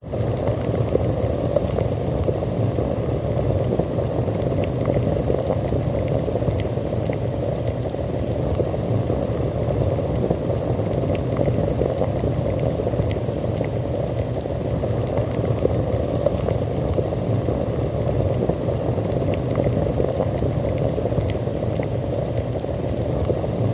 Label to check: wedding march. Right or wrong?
Wrong